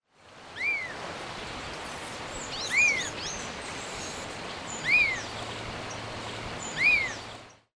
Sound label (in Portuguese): Guaracava-grande Elaenia spectabilis; Jacauna